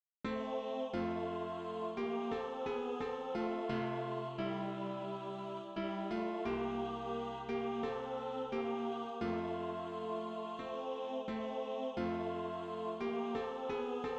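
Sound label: bass, Practise Music; SATB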